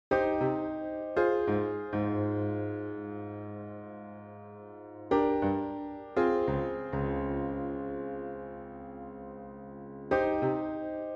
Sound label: miniature in jazz, piano; pieces pour piano